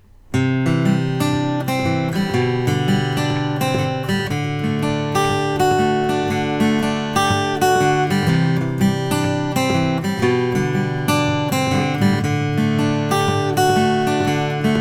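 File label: Tippin OMT koa acoustic guitar; natural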